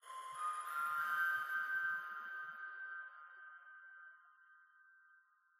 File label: Music Box